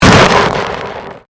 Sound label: Convolution Pulse